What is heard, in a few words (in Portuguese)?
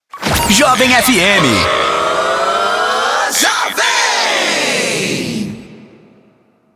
passagem; Jovem FM